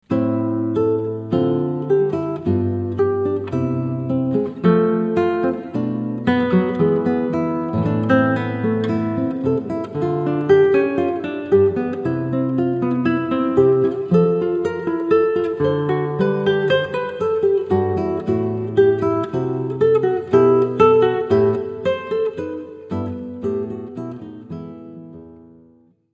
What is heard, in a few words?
gavotte